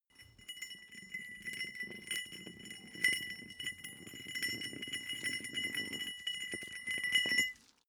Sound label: small metal rolling; industrie wirtschaft; metall